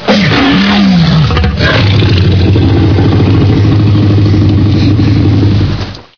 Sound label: voltigore attack melee